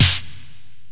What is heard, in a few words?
large room steel snare drum sound f key; Duration 0:01; Samplerate 44100 Hz; Channels Mono